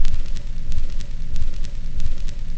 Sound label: static noise loop, 92 bpm; 091 to 100 bpm